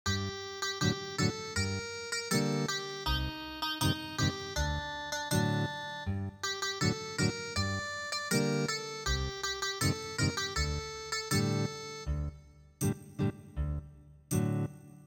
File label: Ethnic Guitar; hanayome ningyou